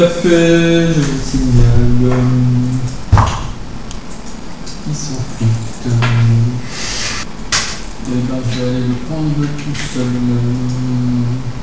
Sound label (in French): komic cafe